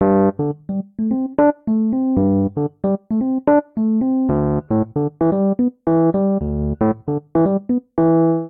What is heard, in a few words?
Ostinato Bass i - v G Minor 113 bpm, Electric Keyboards; MIDI Clips; Ableton; Factory Packs